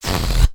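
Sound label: Balloon deflate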